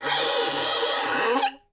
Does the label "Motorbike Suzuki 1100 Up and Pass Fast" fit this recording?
No